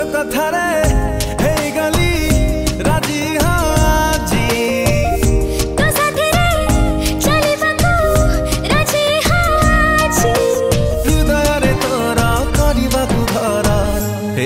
Odia Song Ringtone

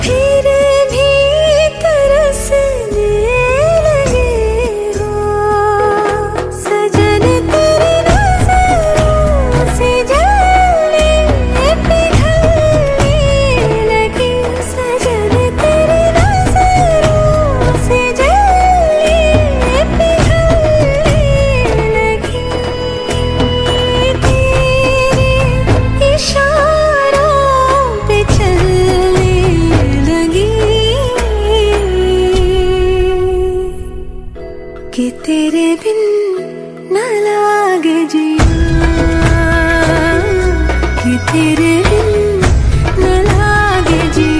Female Ringtone

